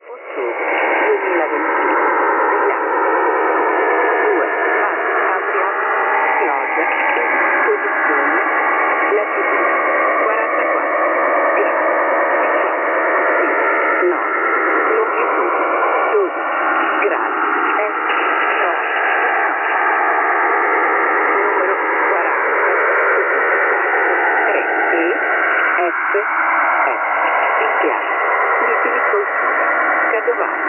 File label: Radio 2624 kHz; IQX Trieste Radio; Perseus; Schwarzsee